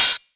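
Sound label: Open hi-hat; Zero-G Trance Inducer; 90 s Sample CDs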